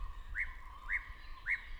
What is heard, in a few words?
racket-tailed drongo call